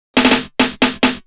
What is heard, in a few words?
Fill, Snare Fills; Drums